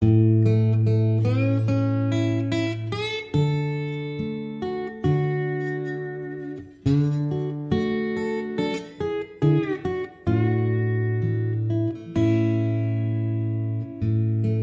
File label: Folk Ballad; Folk Ballad 1